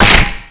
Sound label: hit body, crowbar